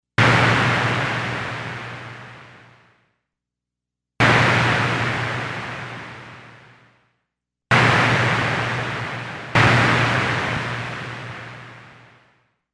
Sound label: Thunder